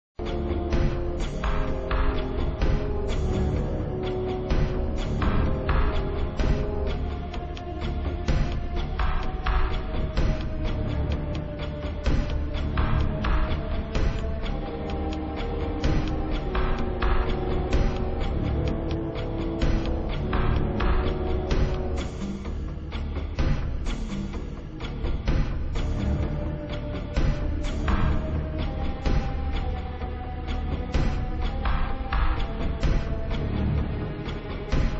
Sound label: Laboratory (Ambient); Disruptor; Antarctica